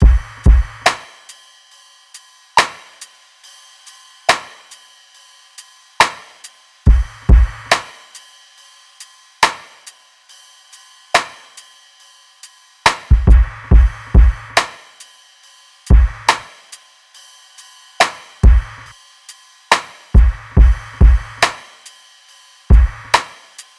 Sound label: drums 70, Full Drum Loops; drum sample pack; Loihi; SEISMIC; Slate